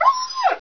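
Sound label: growl pain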